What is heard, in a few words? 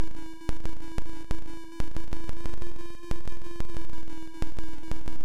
intro small music